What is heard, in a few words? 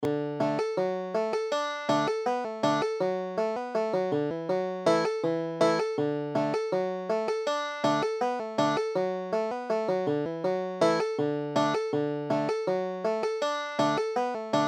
walk old shoe, banjo